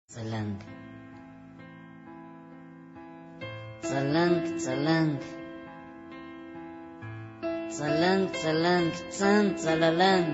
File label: armenian